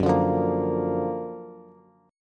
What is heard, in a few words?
Gb Augmented Major 7th, down, Bass; strummed